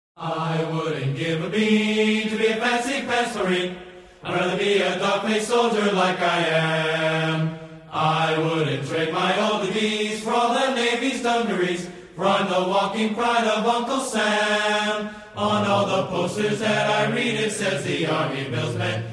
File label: all american chorus, vocals; 82nd Airborne All American Chorus; patriotic